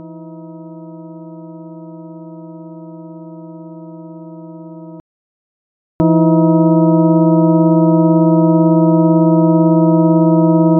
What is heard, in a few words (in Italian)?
il bicordo